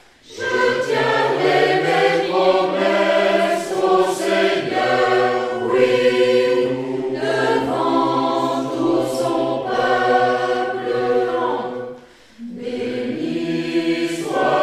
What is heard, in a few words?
Tutti